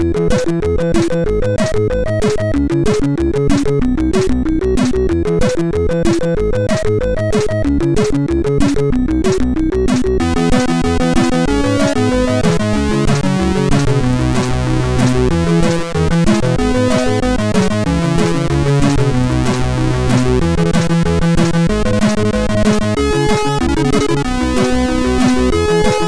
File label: 16 Beat Pop, MOS6581R4